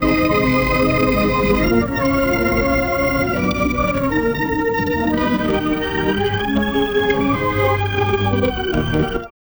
distorted carnival organ

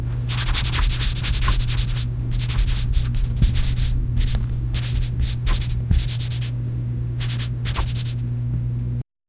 person, smearing

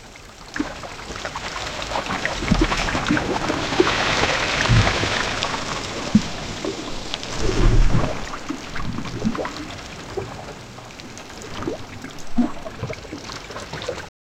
massive splash and heavy, disasters

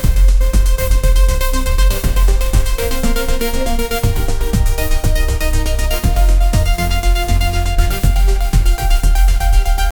upbeat electronic music

